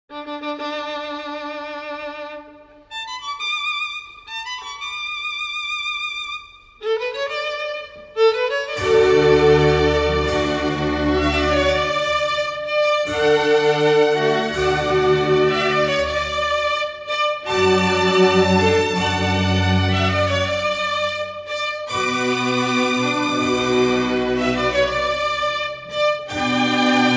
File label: concerto grosso, larghetto e staccato; gendel; no5